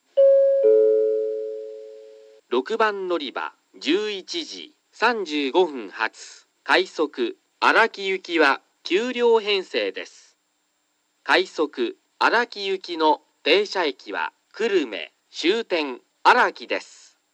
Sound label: tosu rapid araki, portable recording; 6番のりば案内放送（快速 荒木行き）; 鳥栖